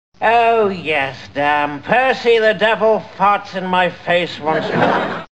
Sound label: fart